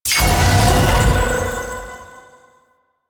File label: Activate Tower Crystal; Warframe; The New War